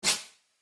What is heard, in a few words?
Arcade Game Bright Sweep, retro; Video Game Sound Effects; Retro Inspired Sound Effects; Think Nintendo, Atari, C64; Andrew Fleming